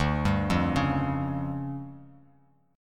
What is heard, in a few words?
D Minor Major 7th Double Flat 5th, D, Bass Standard; DmM7bb5; arpeggiated; JGuitar